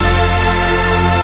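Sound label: majestic chord, pads; instruments single samples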